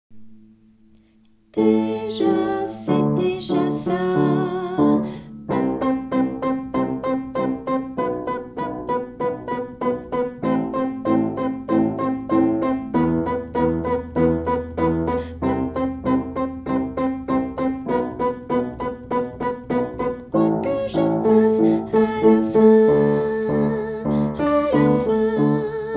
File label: tenor